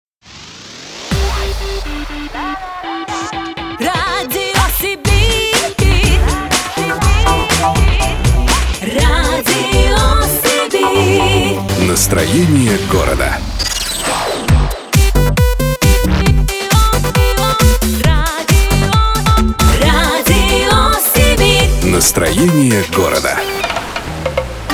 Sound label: Jingles Radio; Radio Sibir; efir